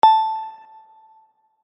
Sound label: start bip